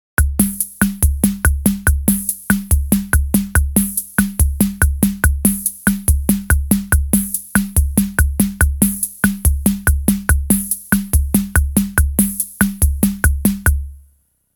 SM RHYTHM1 BEGUINE

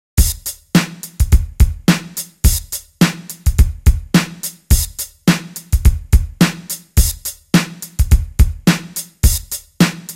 DMX, Mixed

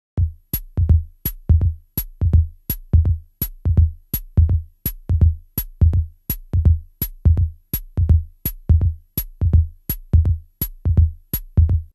RHYTHM SWING

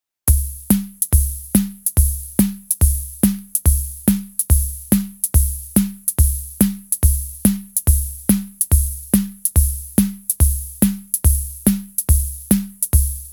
SM RHYTHM1 SWING

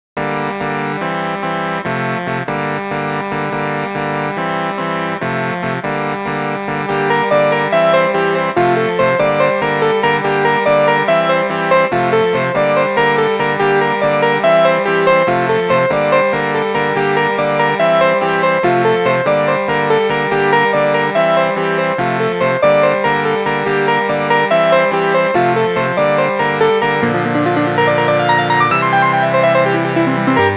Rock Organ

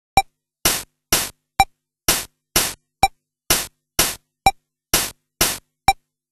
CASIO VL-1 WALTZ

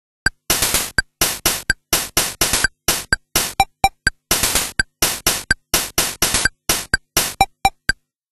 CASIO VL-1 RUMBA

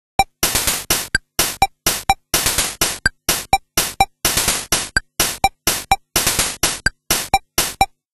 CASIO VL-1 BEGUINE

